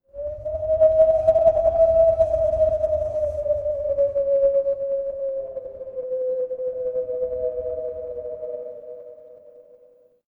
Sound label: ambience wind